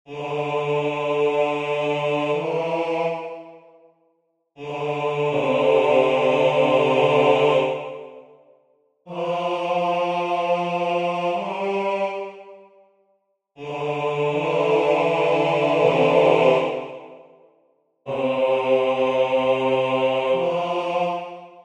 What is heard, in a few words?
alto; N'oubliez mie